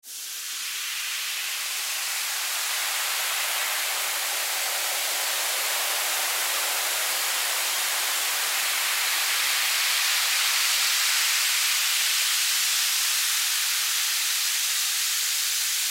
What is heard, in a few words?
Vinegar Pour Baking Soda Mix Fizz; Bubble; Ambience; Foley; Fizzes; Objects; Blastwave